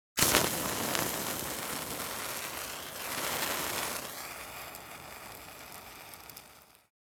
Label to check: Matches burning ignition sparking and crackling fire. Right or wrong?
Right